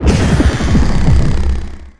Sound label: reality tear